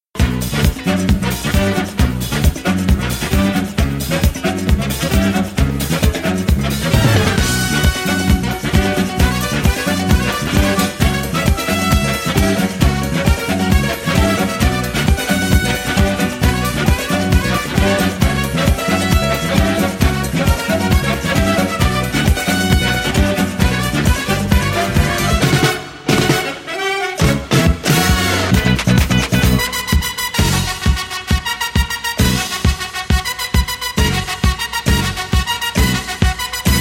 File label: samba